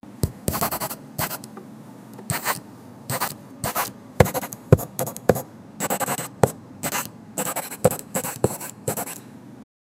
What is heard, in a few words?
note book, pencil